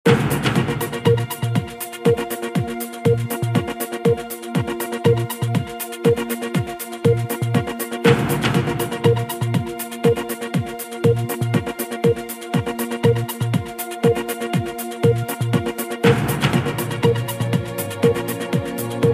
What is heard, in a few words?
BBC News 24 Countdown